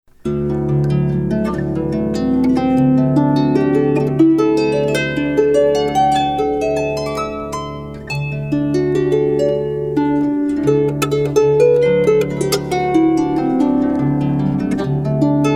harp

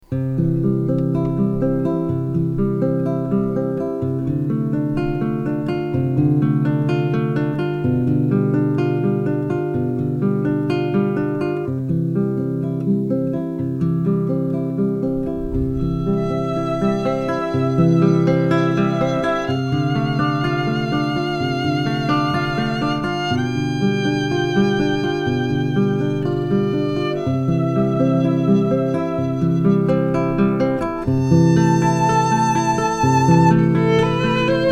ave maria guitar violin; bach

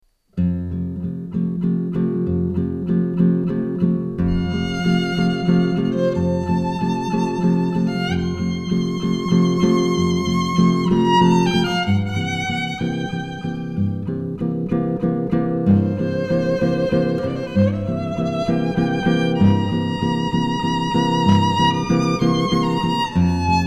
andante, guitar violin; andante from piano concerto no 21

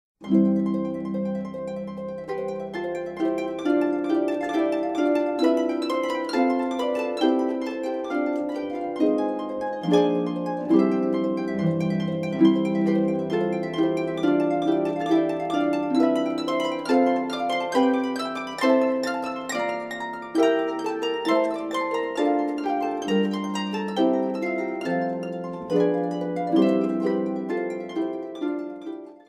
Harp; Prelude in C; classical harp

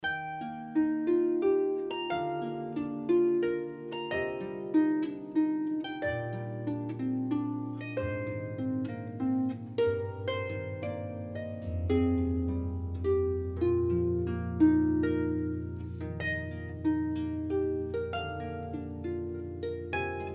Harp Music For Any Elegant Occasion; Jazz Classical Rockband

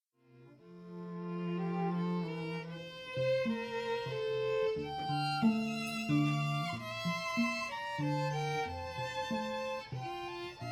TRIO, String Quartet; La Bella Musica

